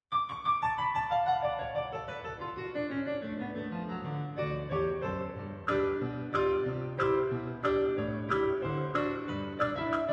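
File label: bright hoe downn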